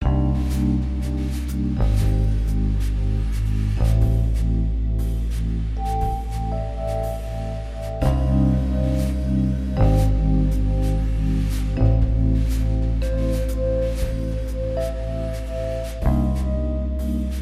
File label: Hookah Lounge With Solo; Headlander